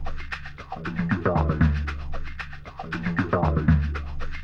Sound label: PAN PERC 112; AMG; Global Trance Mission VOL-2; PROCESEDLPS